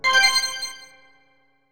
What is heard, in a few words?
Silver Coin; Diddy Kong Racing; N64